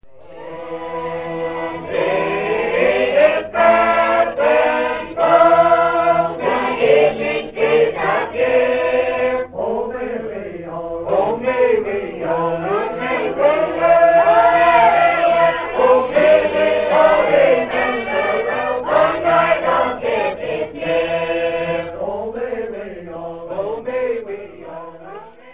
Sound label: harp